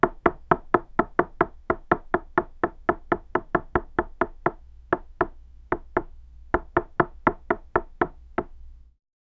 board game; sound effect

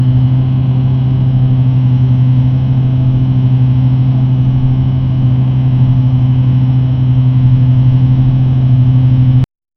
luxury ambient for ad of

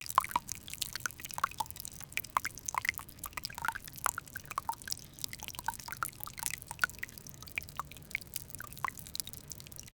a urine stream landing on, pine needles

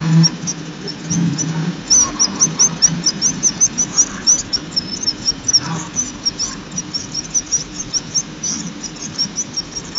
sound that attracts young, call

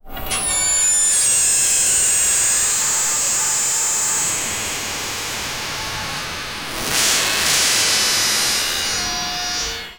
electricity, sound-effects

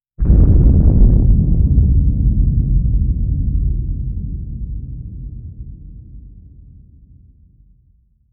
ambiance

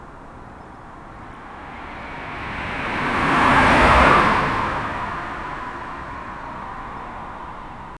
approaching car on a wint

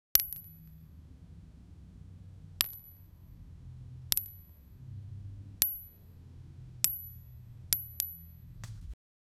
montotonous sound of an a, bow and arrow

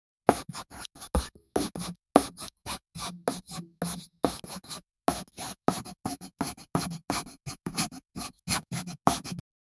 we are opening a nail, beauty

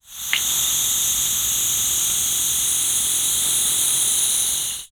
sudden gas liquid leakage

